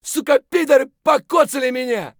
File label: hurt light; Escape from Tarkov